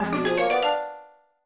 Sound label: Unused Jingle (Evolution); Pokemon Mystery Dungeon Blue Rescue Team